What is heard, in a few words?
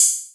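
hi hat, drum; Masterbits - Total Zone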